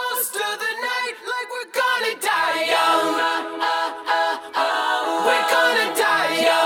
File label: Background Vocals